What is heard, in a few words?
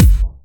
Bassdrum; Vengeance Samples